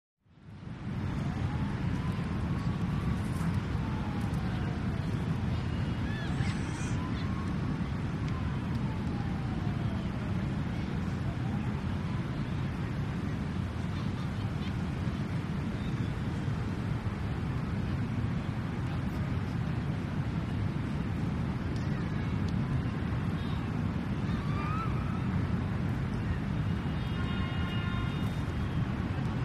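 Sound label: Large City Park Ambience; big city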